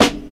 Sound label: tight lows snare drum sample f sharp key